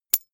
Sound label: key ring small catch; objekte; schluessel